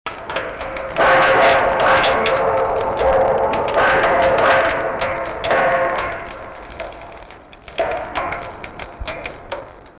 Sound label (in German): alte ruine eine gruppe vo, historic